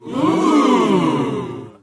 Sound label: oooooh